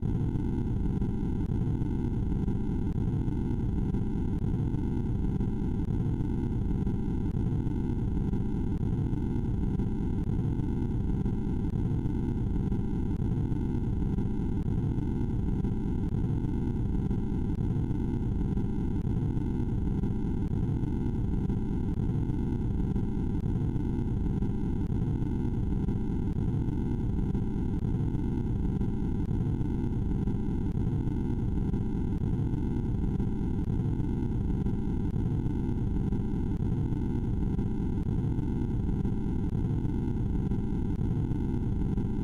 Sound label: noise track